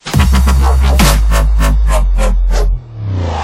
dubstep sms

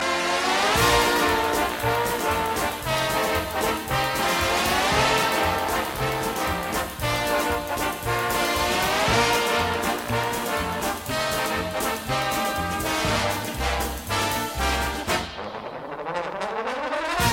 trombones

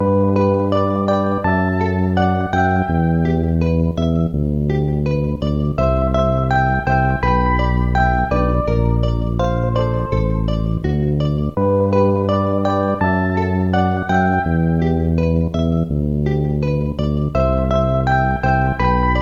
8 bit lullaby

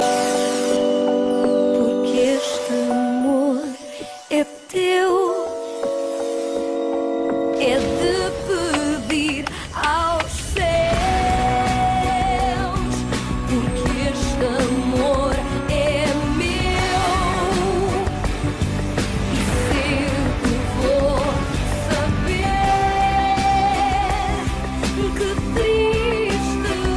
electro

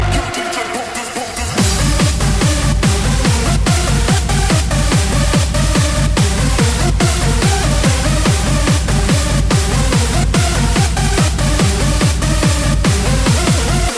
hardstyle rush

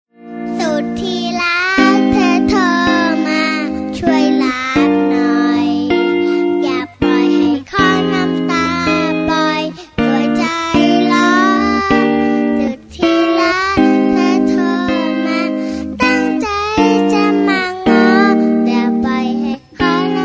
misscall baby sound

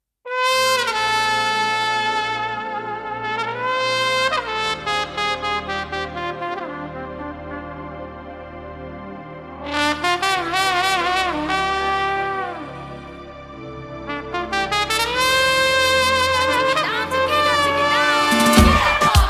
instr

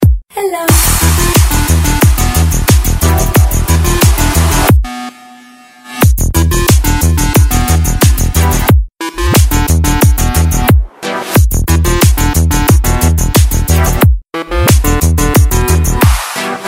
dj mixed tone

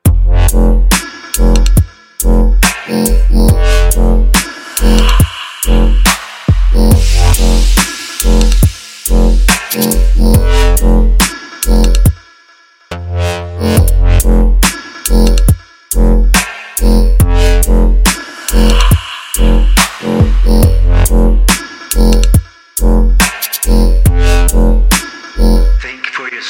dubstep

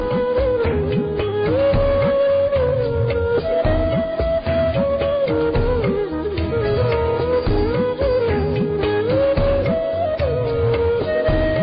flute; choti bahu

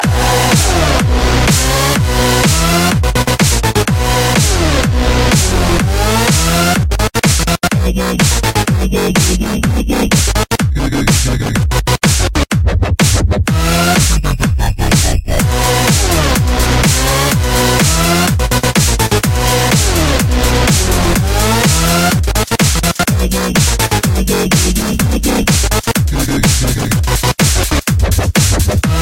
dubstep remix

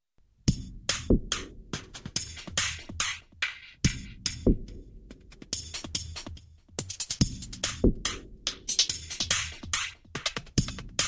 techno

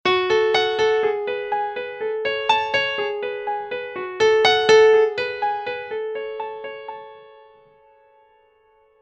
piano music

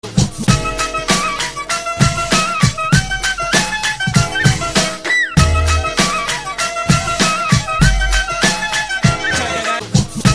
true flute

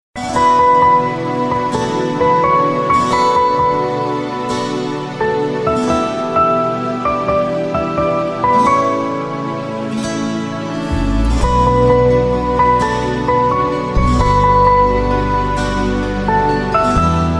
Instrumental

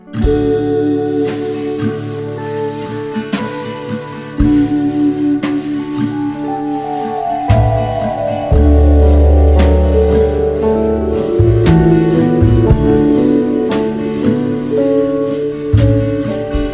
guitar n drums minimal

